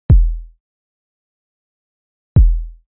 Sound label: Kick Drum E 1